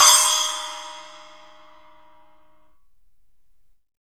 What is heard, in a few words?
SPLASH, Drums; Big Fish Audio; Ross Garfield Drums VOL-2; GM PERCUSSN